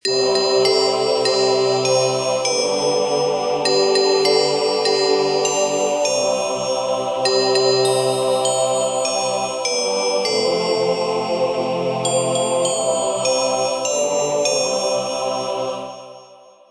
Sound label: birthday loop